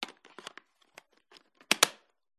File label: glitched tones household gaming video game box cartridge retro insert